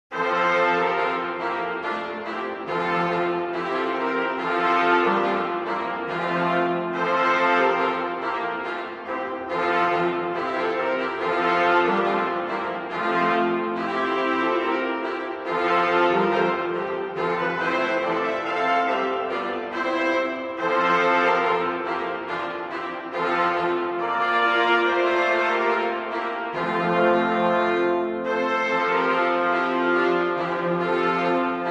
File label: deck the halls brass band